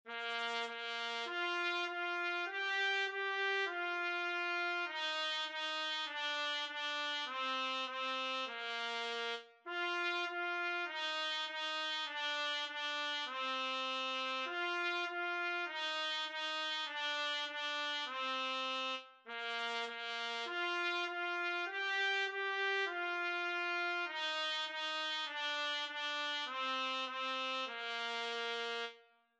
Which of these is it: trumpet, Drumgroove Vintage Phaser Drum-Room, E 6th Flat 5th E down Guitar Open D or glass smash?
trumpet